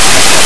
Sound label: Thrust; AsteroidsJava